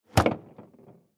Mini Refrigerator, Mini Refrigerator Close Door Rattle; Mini Refrigerator Close Door Hard Rattle; Appliance; Household; Blastwave